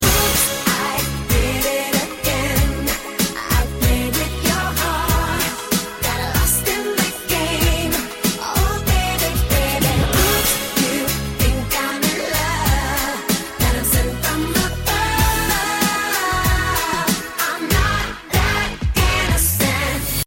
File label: loop chorus mono